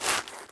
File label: Scratch, Dino